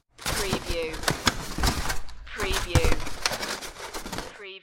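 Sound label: Styrofoam Sounds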